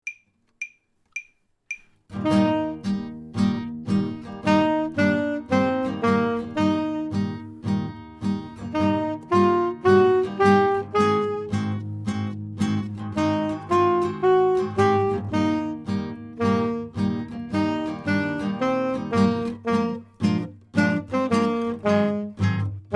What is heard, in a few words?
up and down sax